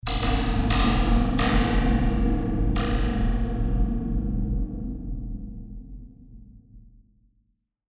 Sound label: Spring Drum